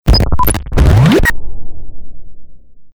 Beep N Bomb; Best Of N2O Library; Breakers & Transitioners

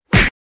Punch Face Hit Sharp Impact; Fights Bodyfalls

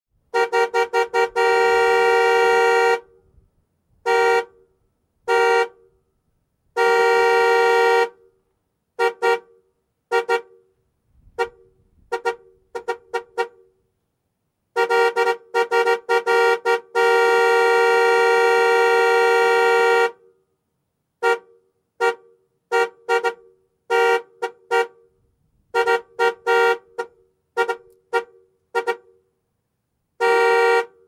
Jeep Cherokee Horn Honks; Cars

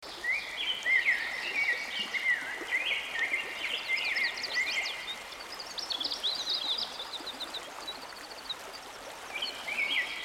red-breasted grosbeak and chestnut-sided warbler

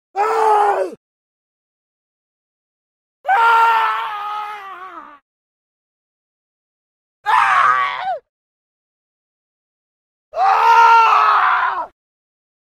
Death Screams 10 Male Scream; Historical; Hollywood Edge Historical Series; War Horses Armies & Weapons

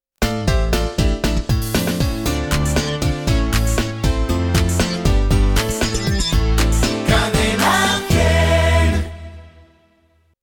jingle

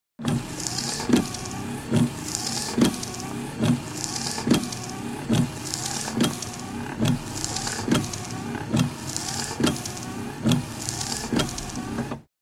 Windshield Wipers Slow Speed BMW; Cars

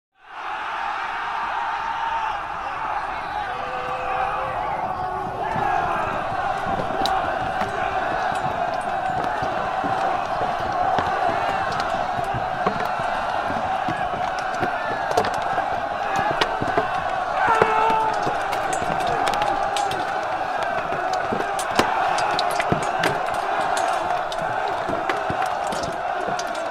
Armies Clash Men Charge; Historical; Hollywood Edge Historical Series; War Horses Armies & Weapons

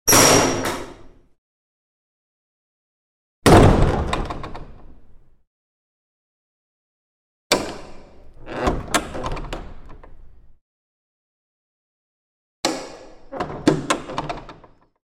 Large Front Door With Spring; Hollywood Edge Historical Series; cd4 Castles Palaces & Monasteries

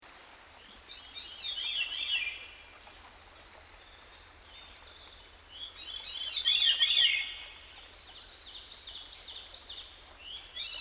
Veery; Dan Gibson; Songbirds By The Stream

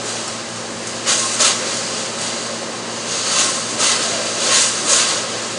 CANNING, INDUSTRY; 1000fx

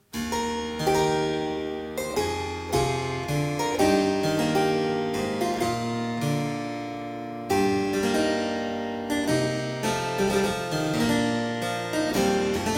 Sarabande Two, Harpsichord Music; Colin Booth; Louis Couperin

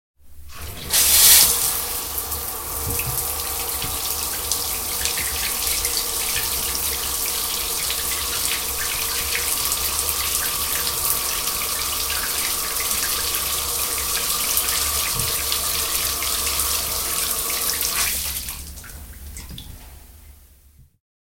Shower; 100 sound effects

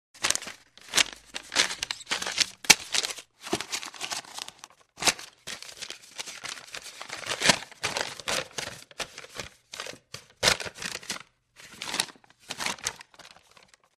Paper Heavy Parchment Handling; Hollywood Edge Foley Sound Library

